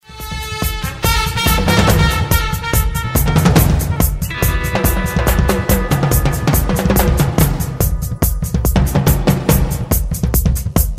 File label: Western Evil